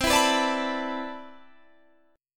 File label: C Major 9th Add 13th, guitar chord